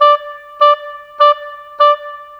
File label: Oboe, Orchestral, Rock Pop